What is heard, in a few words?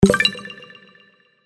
JOIN GAME